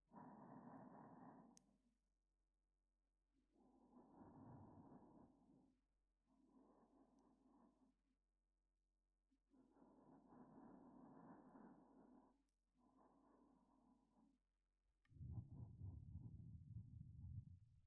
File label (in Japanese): 添い寝ループ